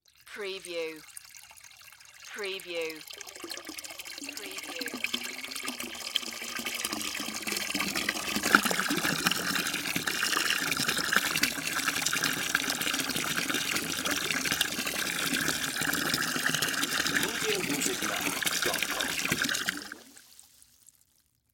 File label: Water Hose Fill Bucket; Sound Effects Bible